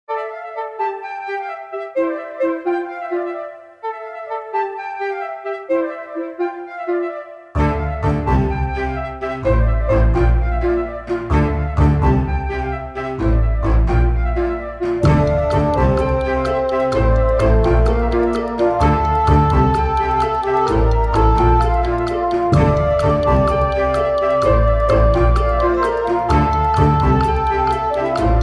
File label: Flute Vibe